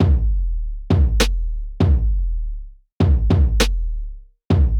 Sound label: DRUM2 DRY